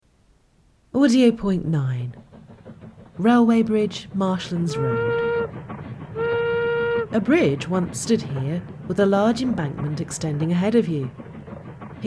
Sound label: Railway Bridge Marshlands Road